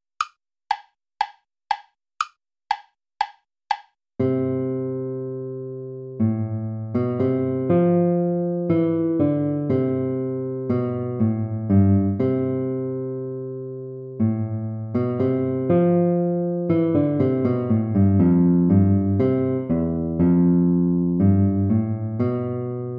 ode joy play along; beethoven ludwig van

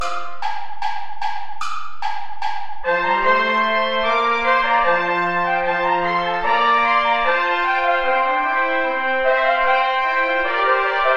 ballet play along without trumpet; praetorius michael des baccanales

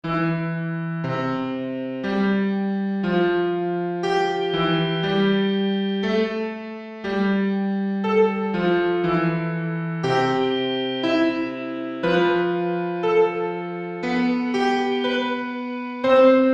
for one keyboard

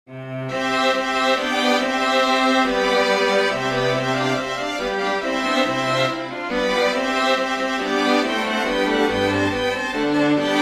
chorus for string ensemble; bach johann sebastian; hat das volk erreget damit

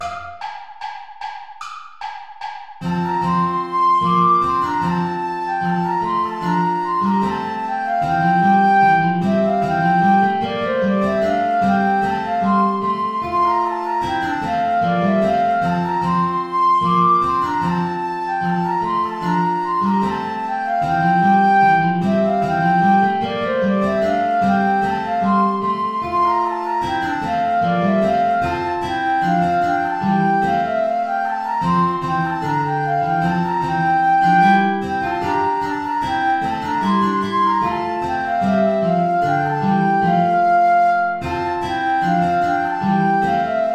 play along without guitar; praetorius michael; ballet des baccanales

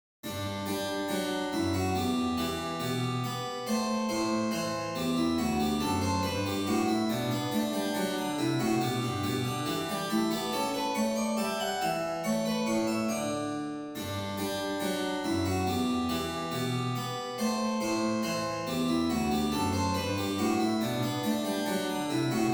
gavotte; Chopin

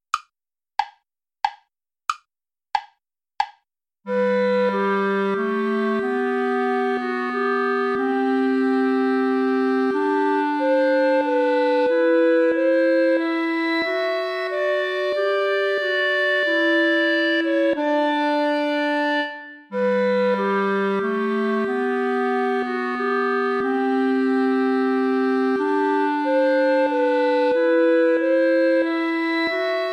sarabande play along without clarinet

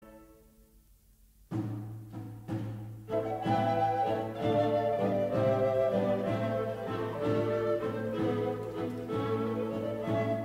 organ; Septima Intrada

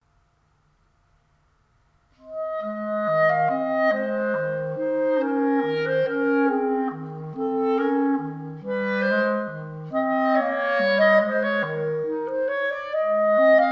duo mineur siciliano; crusell bernhard henrik

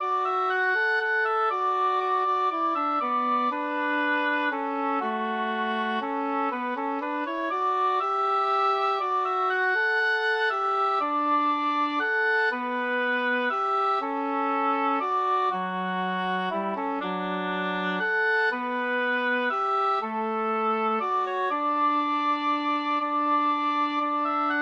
for oboe english horn; o'carolan turlough; si bheag si mhor

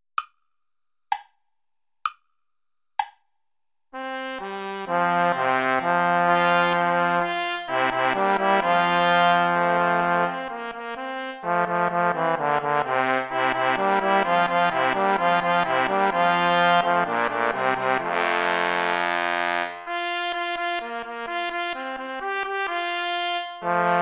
play along without trumpet; praetorius michael; psallite unigenito

